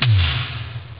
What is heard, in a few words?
m1 gated etom; Amiga Soundtracker Sample Packs